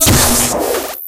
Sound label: stunner, stunstick; weapons; alyx; Garry's Mod; hl2